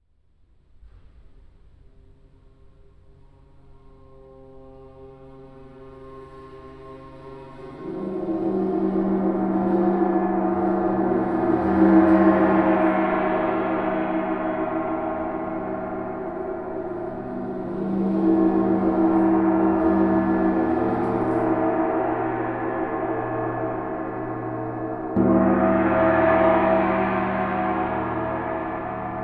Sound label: gong; 32" Gong